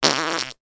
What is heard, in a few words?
human mouth fart